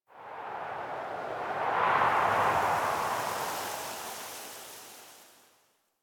Wind Gust Small, Ambience Plains; Warframe; Eidolon Plains; One Offs

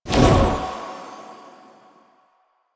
Choir Erupt End; Warframe

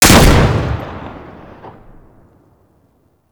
Hand Shotgun Shot, weapons; Grineer

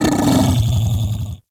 Feral Vocal Downed Get Up; Warframe; Kubrow

